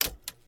Button Up; Game rip; MiSide

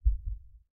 Heart Player; Game rip; MiSide

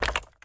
Figure Take; Game rip; MiSide

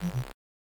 PC Enter None; MiSide

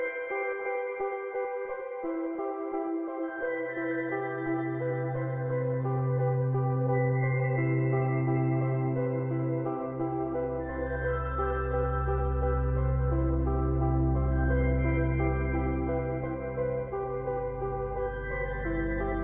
Music; Snaker; MiSide

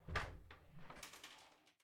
Open Door Exit; Game rip; MiSide

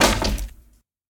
Chair Impact; Game rip; MiSide

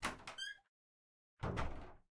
Door Open; Player; MiSide

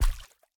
Maneken Step Soft Water; Game rip; MiSide

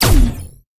Scanner Start; Game rip; MiSide

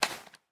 Remove TV Drop; Game rip; MiSide

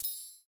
Money; Game rip; MiSide

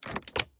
Switch On; Game rip; MiSide

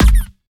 Hammer Kick Player; Game rip; MiSide

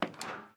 Ladder Step; MiSide

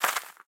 Snowball Take; MiSide

